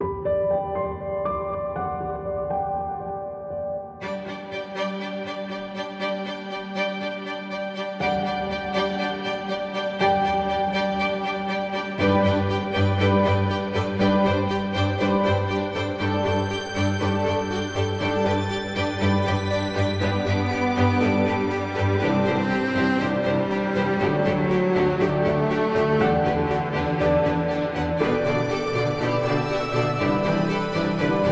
Ambient

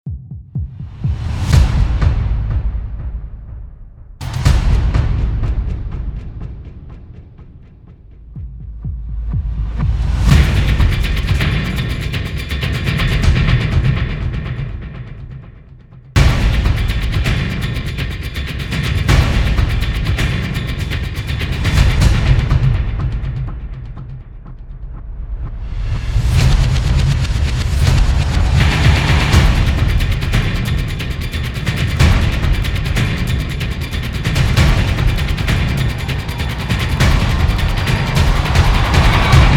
Drums Only Version

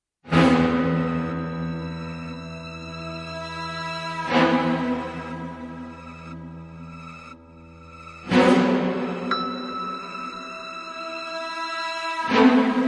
Alarm Ambient

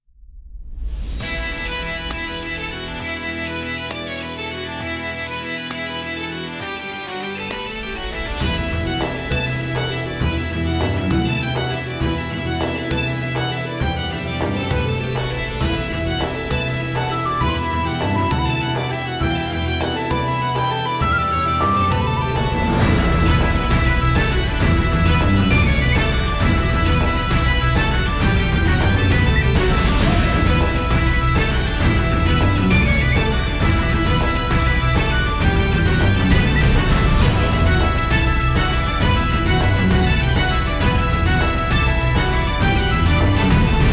Celtic Variations

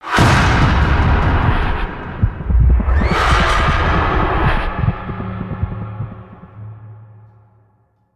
Hit FX Funhouse; Brand X Music; Brand X Music Catalogue - Editor's Essentials

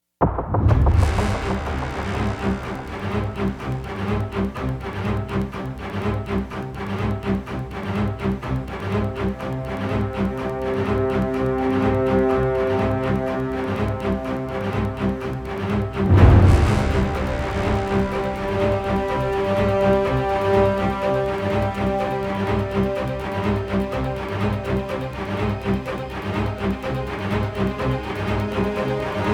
Orchestra and Percussion Only; Ninja Tracks

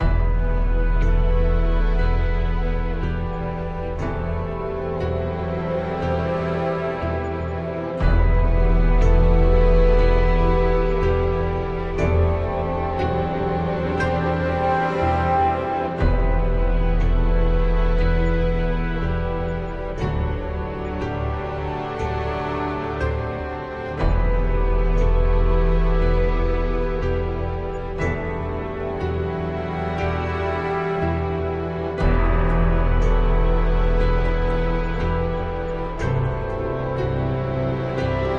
Missing Ambient

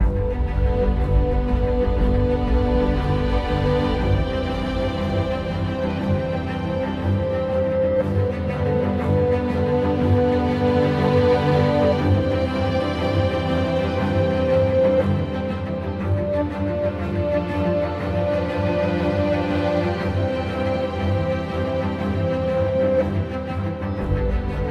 Ambient

